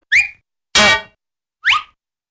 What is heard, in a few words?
clank sandwiched between whistle zips